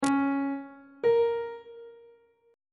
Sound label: relative minor of d flat major is b flat on treble clef